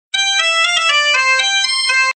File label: Ring; Jurassic Park